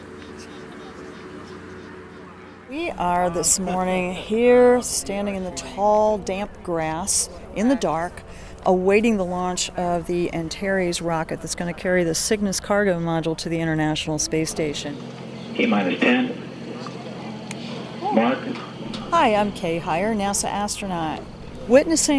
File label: launch mix